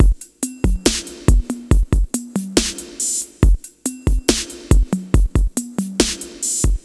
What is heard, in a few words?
Slomo Beat 140 BPM, Loops, Slow Motion Beats